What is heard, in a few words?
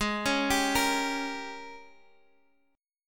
Ab Major 7th Suspended 2nd Suspended 4th Ab Guitar Open G; 18,18,17,15,x,x